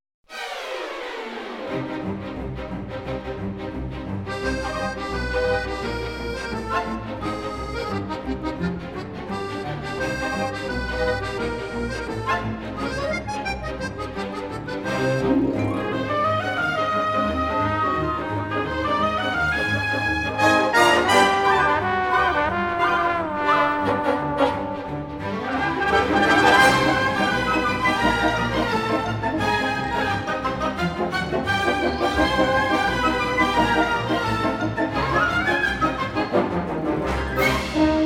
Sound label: Comedy Action Orchestra